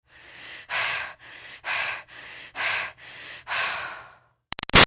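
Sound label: Human Female Breaths Exercise Steady-Slow; Breathing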